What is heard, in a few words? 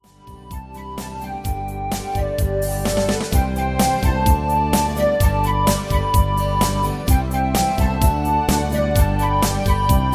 Instrumental; Singing Calls